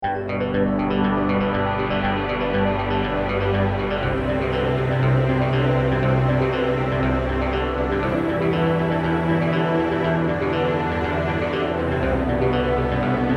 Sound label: Uplifting